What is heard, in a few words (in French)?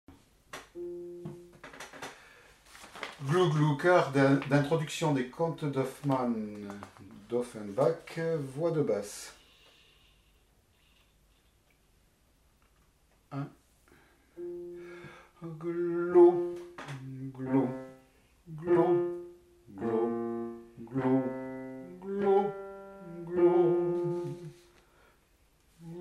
basses